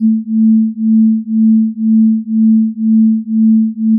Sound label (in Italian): battimento